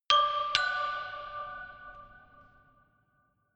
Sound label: notification glockenspiel creepy third up